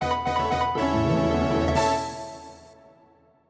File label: Fanfare (Villain Identified)